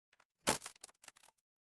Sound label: impact crate break